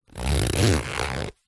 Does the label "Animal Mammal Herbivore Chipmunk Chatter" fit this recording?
No